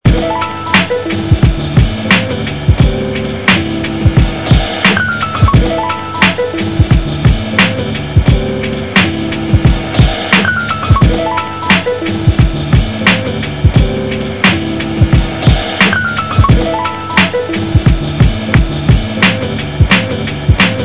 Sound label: Just Jazz